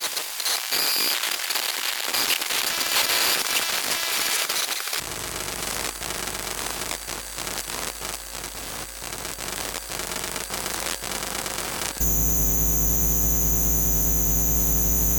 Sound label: electromagnetic field; zapsplat